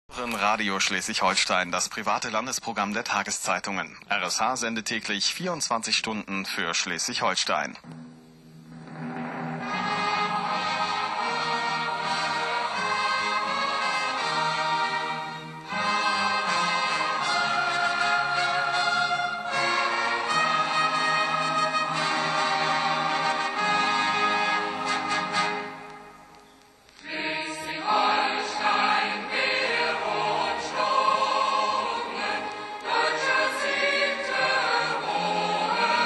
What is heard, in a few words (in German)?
Schleswig-Holstein meerumschlungen Hymne